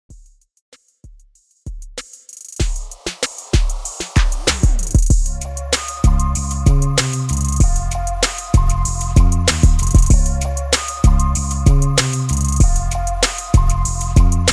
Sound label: REDRUM 96 BPM